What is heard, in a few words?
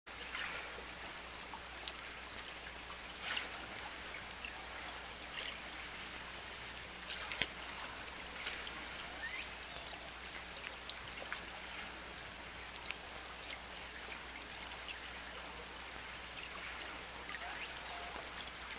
few S2s in 1st 5 min, clicks, waves like blows, quiet last 10 min, orca